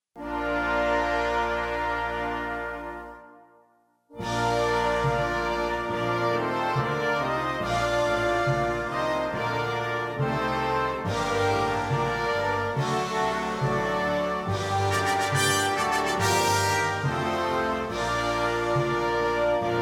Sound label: Ukraines National-Hymne WindBand